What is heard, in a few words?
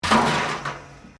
METAL BIN IMPACT WIRE FENCE; Metal (Sound Effects:Materials)